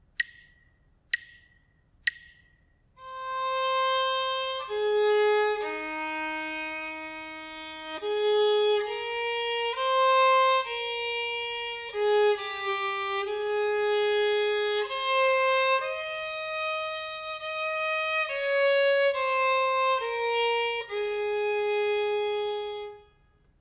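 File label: melodic dictation; ear training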